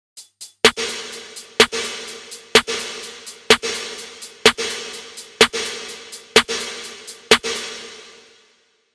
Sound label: Snare